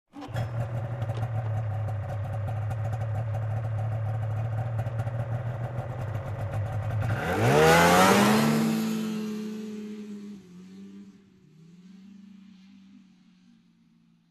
Offroad motorbike driving away

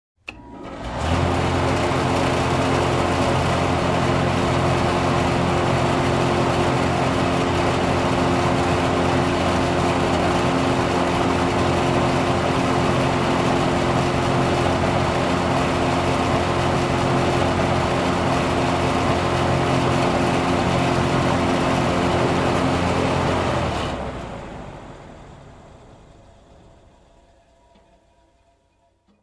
Industrial Mixer Machine turned on and off

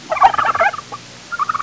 Turkey clucking sound effect

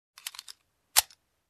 Inserting pistol magazine

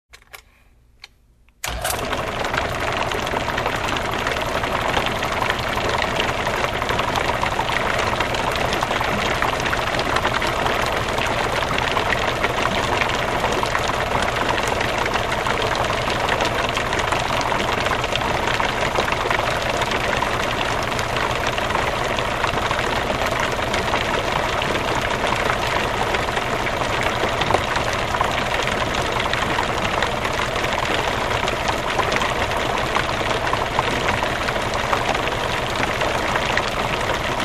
Steady rattling sound

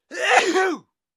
Adult Male Sneezing

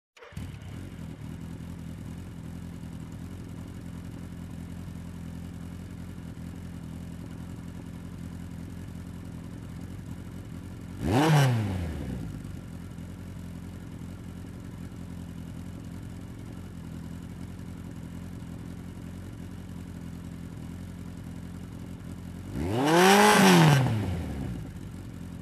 Motorcycle engine running idle

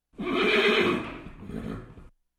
Horse neigh in the stables

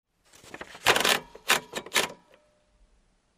Inserting Paper on a printer

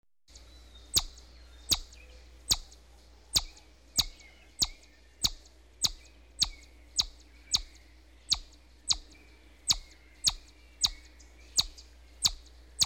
Bird chirping background ambience

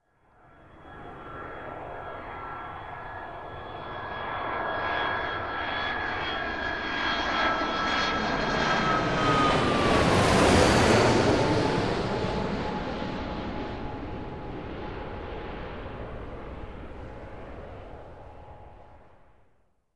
Jet aircraft landing flying over

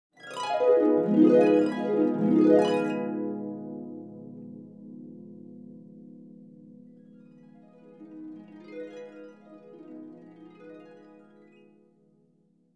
Dream Harp Glissando Up Down

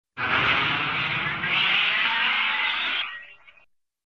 Glass shatter slowed down

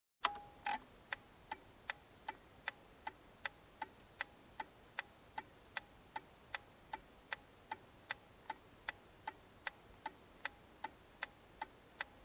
Motorcycle Blinker

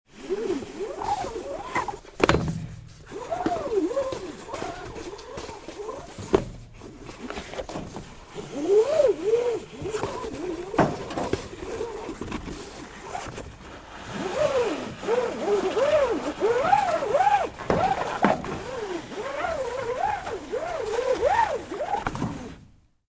Fire water hose roll up

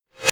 Electronic Switch Sound

